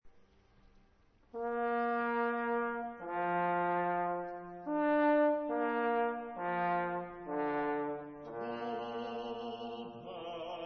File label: requiem tuba; Mozart